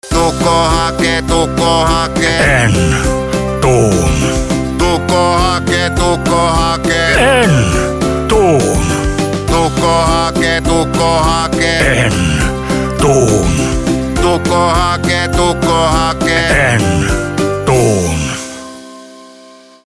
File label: jingle bells